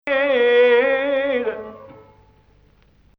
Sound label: Ajam; 2nd Ajam 8-11